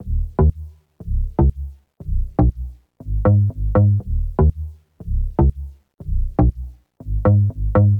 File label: Bass, Dancefloor Pop; Remixlive